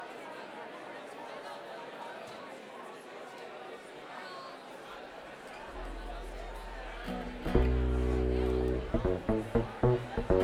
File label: Crowd Banter